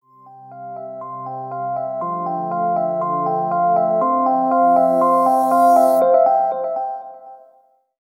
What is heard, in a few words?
elegant corporate logo sound